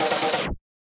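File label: drum fill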